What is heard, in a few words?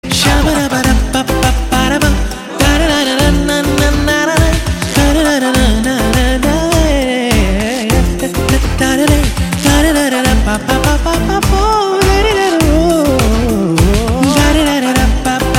Humming